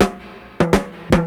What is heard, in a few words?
DRMROLL; E-Lab; X Static Goldmine 2; akai